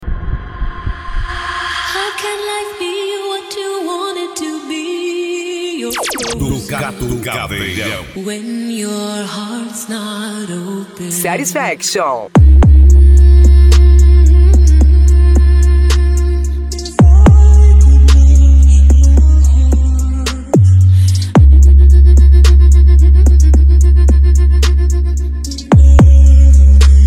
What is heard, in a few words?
funk mega bass